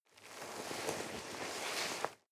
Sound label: nylon leather soft rustling